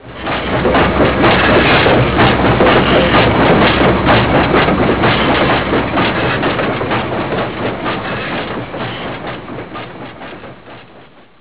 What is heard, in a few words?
train sound